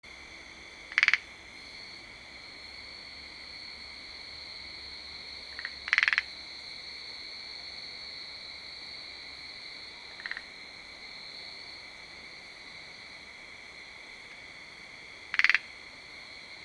Rhacophorus bipunctatus

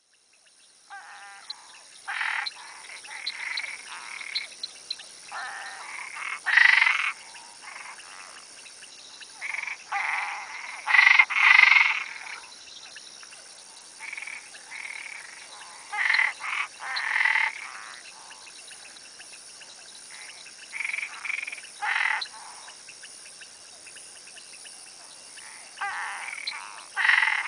Boana albopunctata

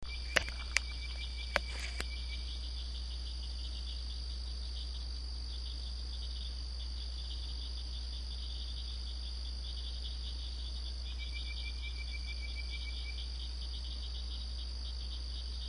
Hyla avivoca

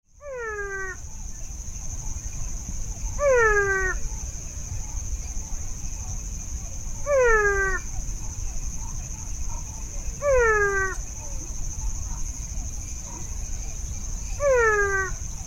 Physalaemus marmoratus

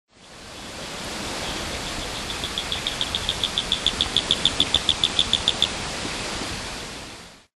Boophis majori

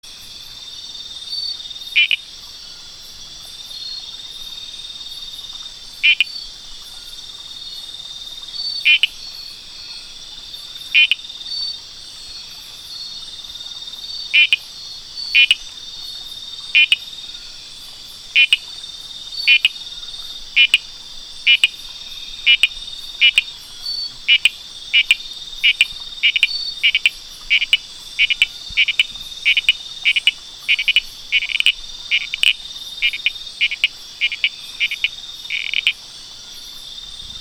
Leptobrachella heteropus

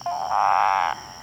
Boana paranaiba

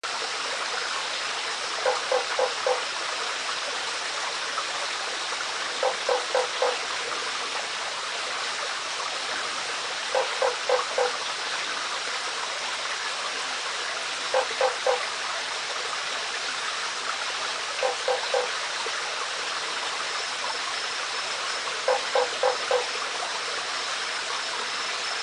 Boana lundii

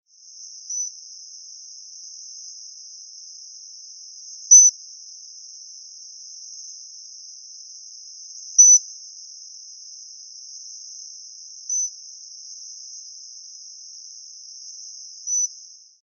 Stumpffia pygmaea

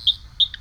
Barycholos ternetzi

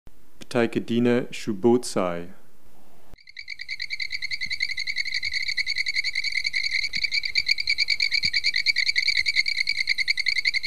Ptychadena tellinii

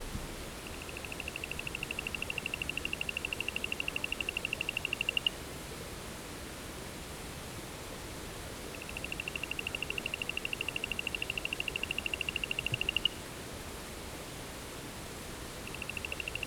Psychrophrynella chirihampatu

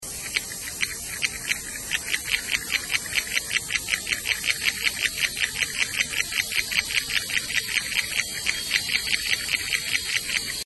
Mantidactylus tricinctus

